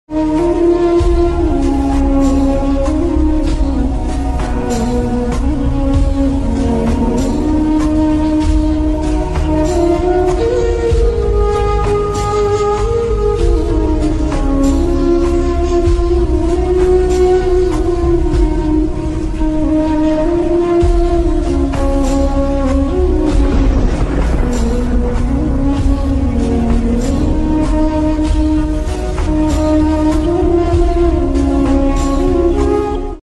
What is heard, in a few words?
Sad Shayari Background Music